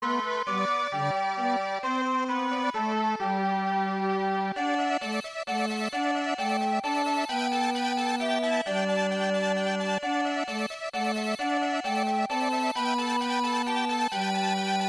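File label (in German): frühling; harvest moon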